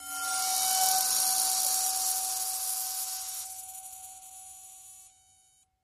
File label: Vibrate Bowl, bowed metal